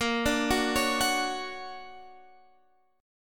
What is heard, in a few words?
D Augmented Major 7th Bb, guitar